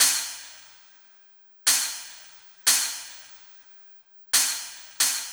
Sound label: Cymbal; Sonic Foundry; Sony Creative Software; Hydroponic Hip-Hop; Beat Components; Hi Hat Cymbal Patterns